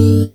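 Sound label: chord sweepy, Stabs, House; E Lab; XTc Files of House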